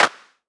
Clap Septet, One Shots Drums; Ableton Live 12 Lite; Core Library

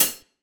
Hihat Closed; One Shots; Drums; Founder

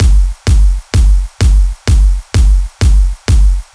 EDM Kick and Ride 128 bpm; Loops; Ableton Live 12 Lite; Core Library; Drums

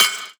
Perc Metallic; Drums; Misc Percussion

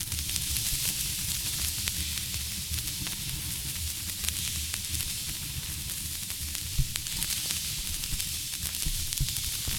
Static; Ableton Live 12 Lite; Core Library

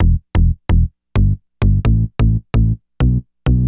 Splat Bass; Sony; ACID Pro 7.0

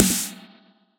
Snare Charred, drums one shots

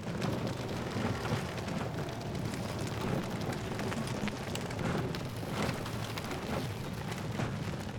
wagon cart loop; props

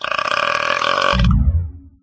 drink burp; There; ThereClient

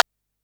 Rim Clap; One Shots; Drums; Fenk

